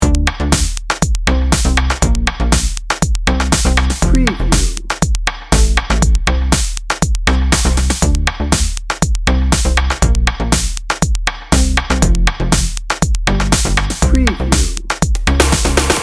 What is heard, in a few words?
dance it out dnb loop